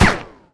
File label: silenced, weapons